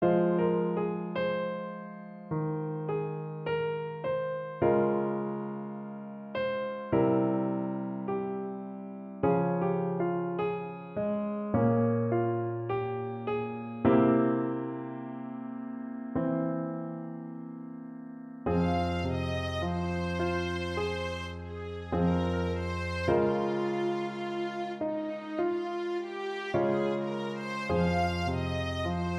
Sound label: Song for Janet arr. for Viola and Piano; excerpt; This is an arrangement of my original Song for Janet for Viola and piano, instead of clarinet and piano.